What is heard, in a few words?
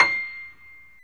PNO, Grand Pno, Roland